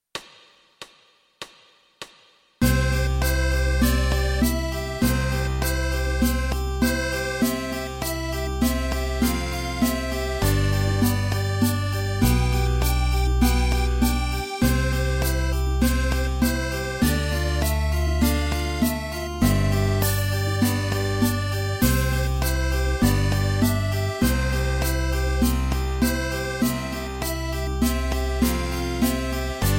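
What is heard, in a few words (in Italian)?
So dove sto andando, Fisarmonica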